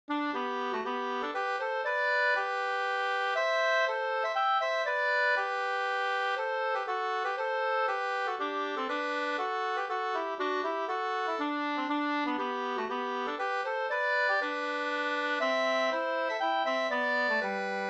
hambopolska

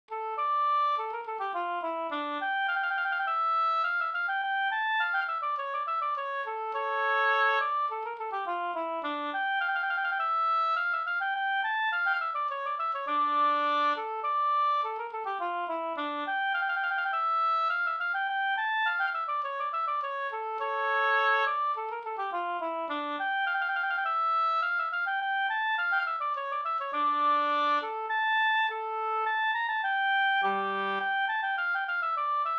polska Senpolska

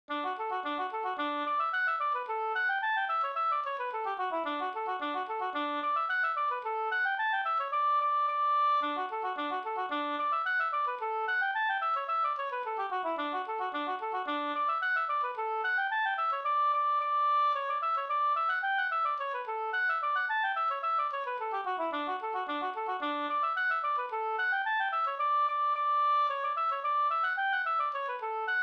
engelska

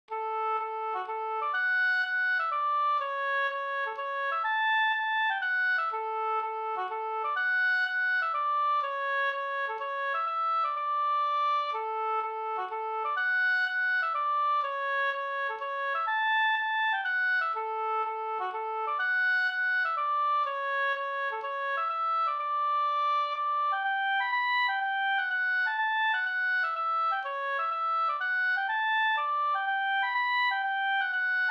hamburska; Kjula Sd

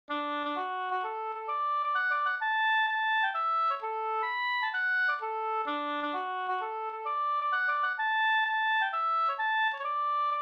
hambopolska

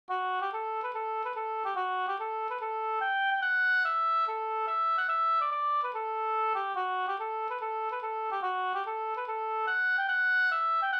schottis; Min tos Flen035

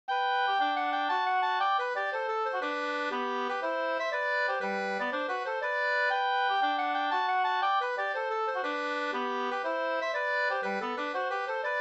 polska

